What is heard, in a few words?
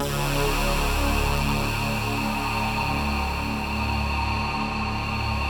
PULZEWAVES, KEYBOARD PADS